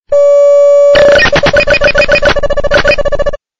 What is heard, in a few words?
Glitch 3D